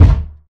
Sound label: strong bottom end bass drum d key; kick